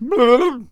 Bard Attack Vocals

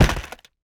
Ice Mage Hit

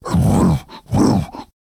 Minotaur Attack Vocals

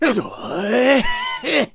Samurai Attack Vocals

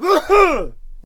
Cannon Attack Vocals

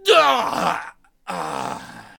death vocals; Shogun

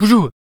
Stoner Attack Vocals

